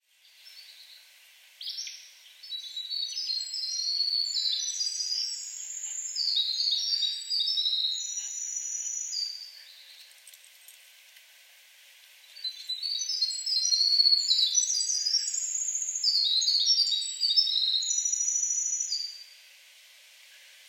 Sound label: Winter Wren Acadian Flycatcher; Rib Mountain SP